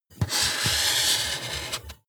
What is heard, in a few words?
marker mark normal